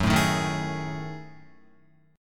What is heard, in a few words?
Gb Minor 6th Add 9th Gb down Guitar; strummed; JGuitar